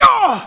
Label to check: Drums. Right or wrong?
Wrong